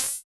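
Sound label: FX synth; Sound & Vision - Gigapack II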